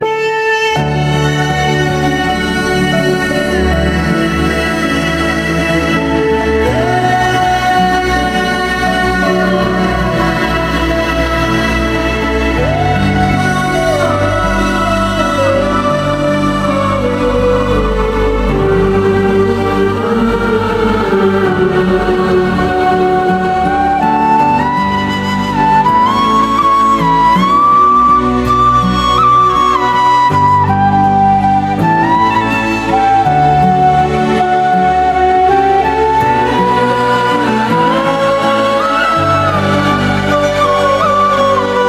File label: Flute BGM